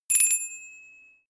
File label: melodic tiny bells